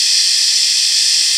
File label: Shush; Noises, Organics; Xfer Records Serum; Serum Presets